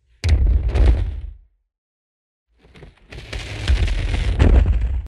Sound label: fall down